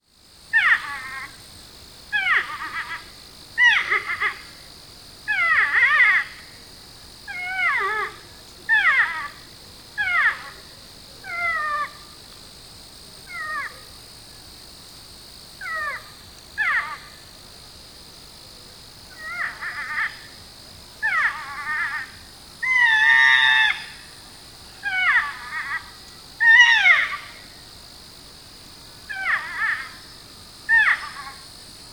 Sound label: porcupine; lang elliott